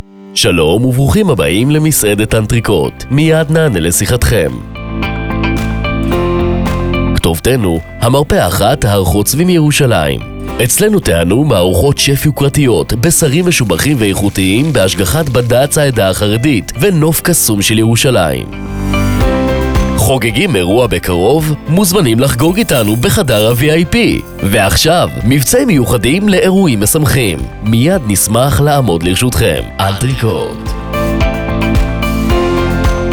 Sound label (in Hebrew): גינגל עסקי מסעדת אנטריקוט